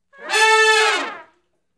Hi Rip Up & Falloff (A), Horncraft for R&B, Sections; Crimson Blue and Fabulous Horncraft 4 RnB